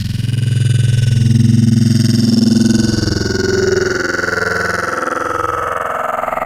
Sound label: Spectral, Wavetables; ESW Spectral - Yayiiii